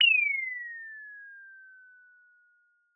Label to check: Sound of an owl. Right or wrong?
Right